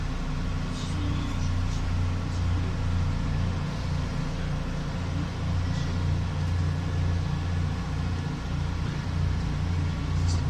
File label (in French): ambiance, Metro